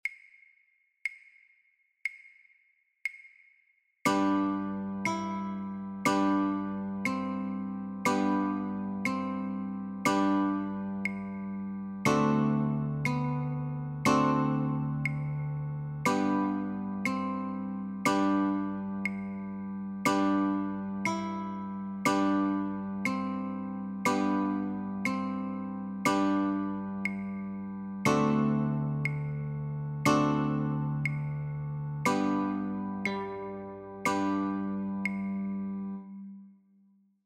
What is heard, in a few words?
Notes Chords and Claves, guitar; childrens; London Bridge